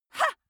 female attack hah; schreie; menschen

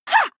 female shout attack short ha; schreie; menschen

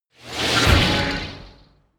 promo pad; whooshes rises

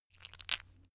stone gravel clatter short; natur-tiere; steine

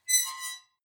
metal squeal bright high; metall

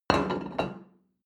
plate place table hard

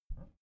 bathroom cabinet creak open soft; objekte; tueren

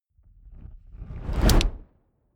fire whoosh fade in; swooshes-swishes

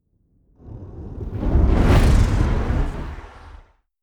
sci-fi vehicle take off growl; raumschiff

